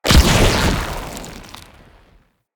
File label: Root Spider Mother Web Shot Proj Burst; Animals; Spiders; Abilities